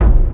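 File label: engine loop; vladimir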